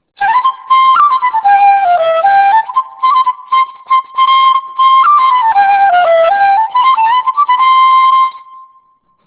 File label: fife; baltimore